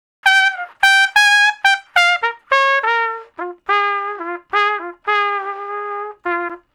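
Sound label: Trumpet Slow Blues (Bb IV, I, V), solo, R&B; Crimson Blue and Fabulous Horncraft 4 RnB; Horncraft for R&B; Solos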